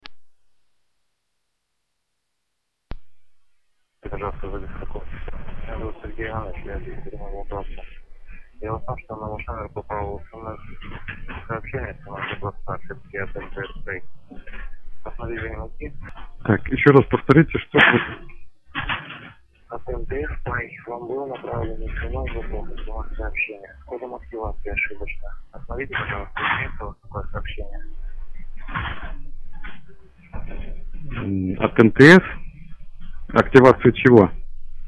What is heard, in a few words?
incoming call; запись разговора